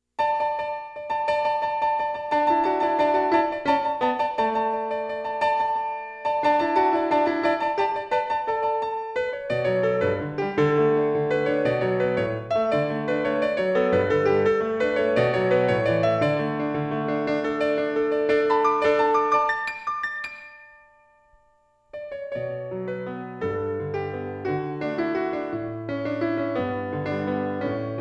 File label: piano